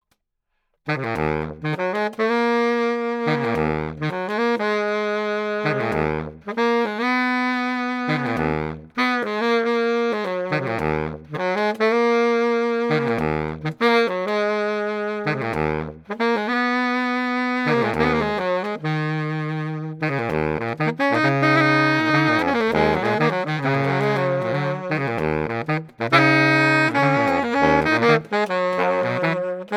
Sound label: baritone sax